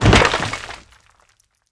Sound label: Trap Collapse; The Croods